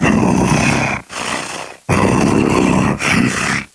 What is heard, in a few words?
Roar; KF Christmas; Patriarch